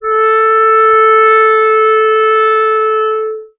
harmonica; Winds

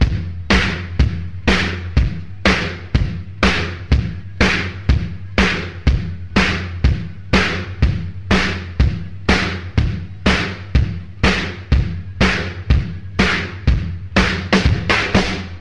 stomp n clap, Funky Drum loops

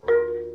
vibes, synths; Notes

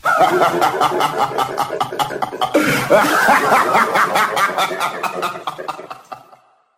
laugh lucifer; ooh ahh

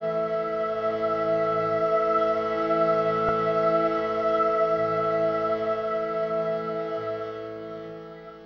flutey, Synths; Notes

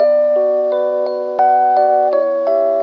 vibrato

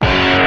hair metal, Guitar; Notes

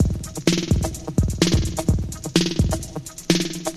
give me some drums, Drum loops, Electro

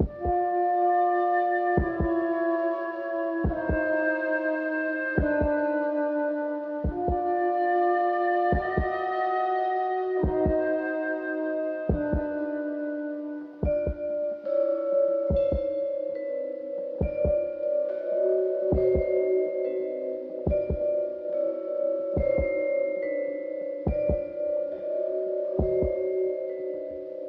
flute and keys, Sad

